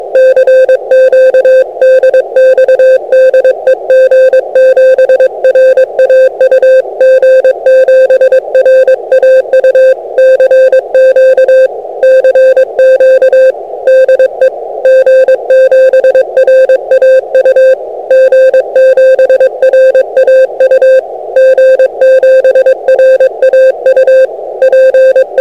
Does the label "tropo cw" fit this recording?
Yes